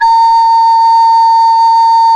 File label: SOP A#3, MOECK; SoundBytes - Early Patches